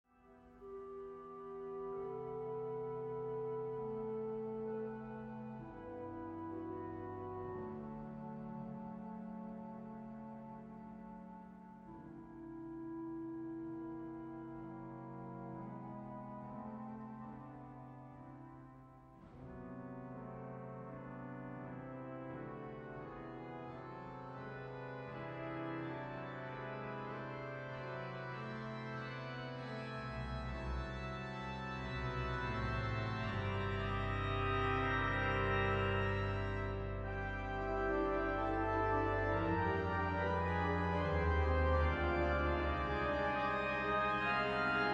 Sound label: organ, passiontide